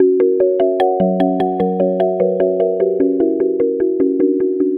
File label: Wood Mallet